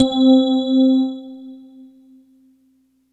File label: Ensoniq SQ 1 Encore C4